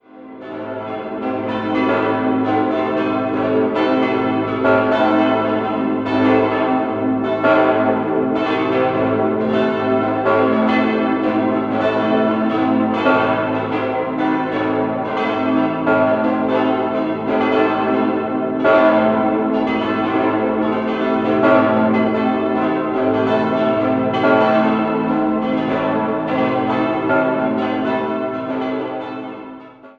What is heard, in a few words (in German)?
Feldkirch-Altenstadt, Glocken